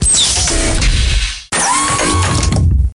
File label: Siege Mode Tank